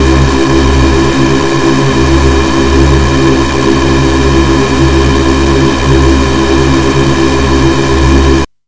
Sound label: glass cutter, Synth